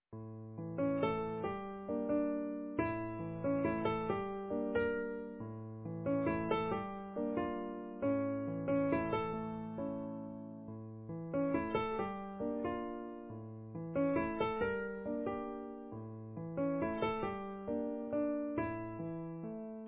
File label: Easy Piano